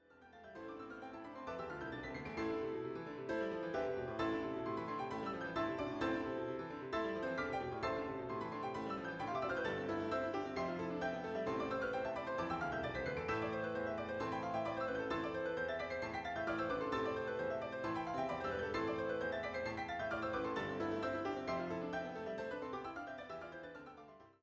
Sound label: 4-Hand Piano; Mvmt 3